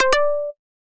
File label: Match Sound